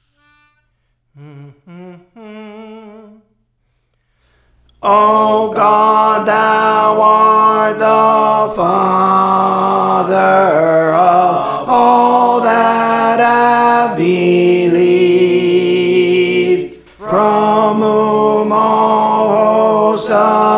Tenor, Songs; Praise For The Lord Training

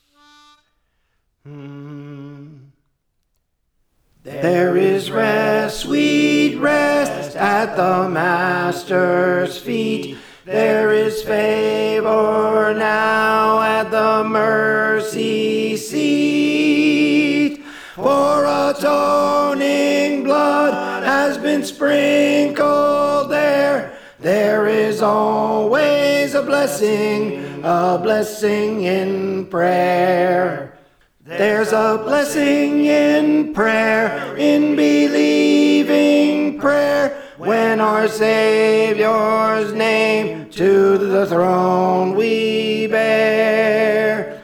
Tenor; There Is Rest Sweet Rest; Praise For The Lord Training

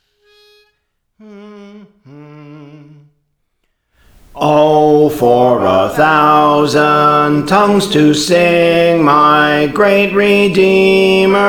Bass, Songs; Praise For The Lord Training; Azmon

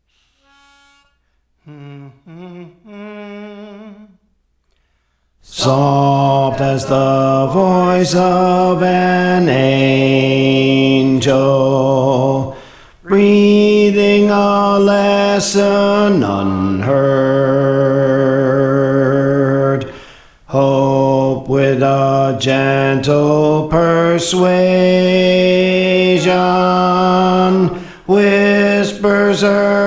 Bass; Praise For The Lord Training

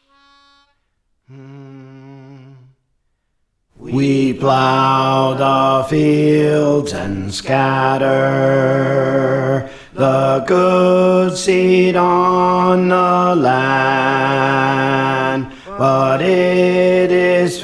Bass; Praise For The Lord Training

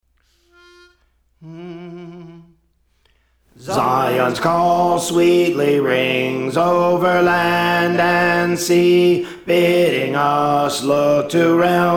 Alto; Praise For The Lord Training

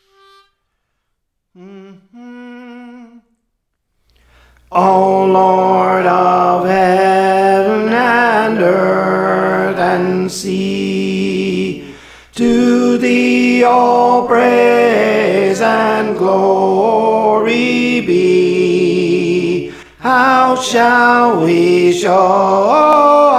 Tenor, Songs; Praise For The Lord Training

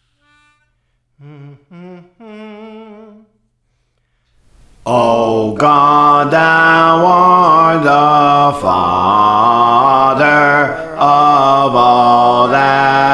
Bass, Songs; Praise For The Lord Training

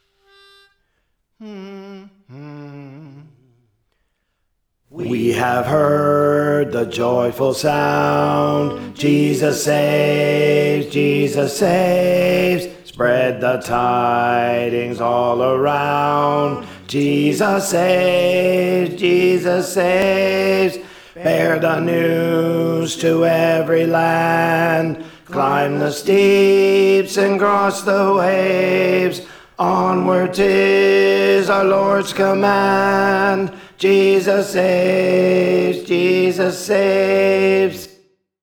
Alto; We Have Heard the Joyful Sound; Praise For The Lord Training

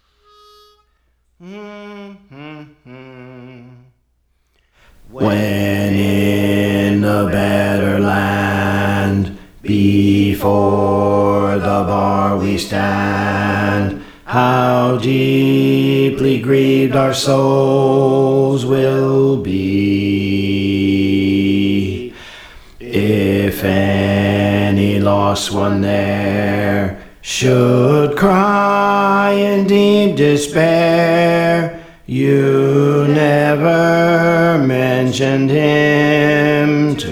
Bass; Praise For The Lord Training

